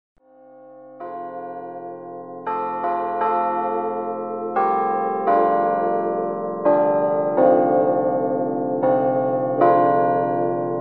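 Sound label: Bells with Harp Accompaniement, electronic carillon bells; Verdin Bells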